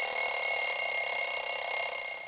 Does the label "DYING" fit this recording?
No